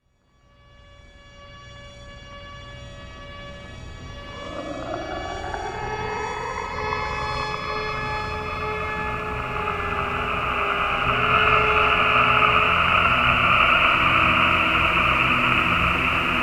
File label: noise